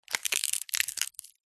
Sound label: dry dead bark section twist crush snap; zapsplat